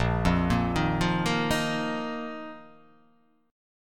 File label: A sharp Suspended 4th; arpeggiated; A#sus4; JGuitar